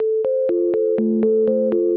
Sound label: 122 BPM Melodies Melodic Motifs 1 Bar A maj G min Tonic A; mmb studio soundtrack essentials